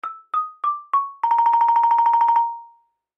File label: Xylophone Melody, Xylophone Melody Sad; Mallets; Musical; Blastwave